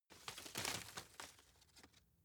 shake fall medium, foerster; berufe

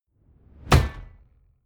sci-fi ricochet metal; schusswaffen

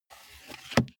car bmw e46 interior sun visor mirror slide long

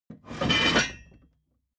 metal scrape wood hard

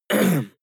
harrumph male mouth open short; koerpergeraeusche; menschen; soundpool

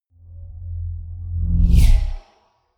sci-fi vehicle drive by tonal fast; raumschiff

